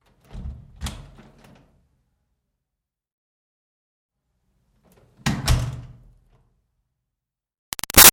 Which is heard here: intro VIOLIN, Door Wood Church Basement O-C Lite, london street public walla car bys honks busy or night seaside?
Door Wood Church Basement O-C Lite